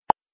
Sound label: pop; facebook